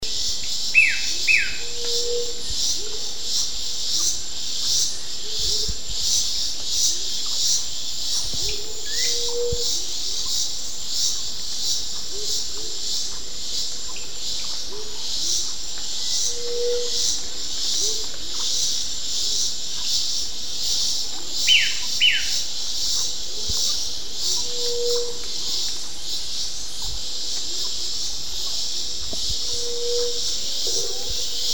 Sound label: Brownish twistwing; پرنده تابیده بال قهوها